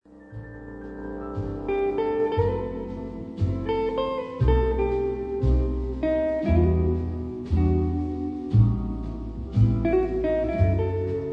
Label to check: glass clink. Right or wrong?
Wrong